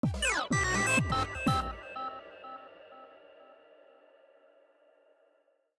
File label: Reverse Win; PAPER MARIO Color Splash; wiiu